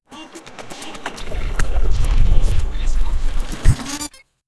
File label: mischievous playing drama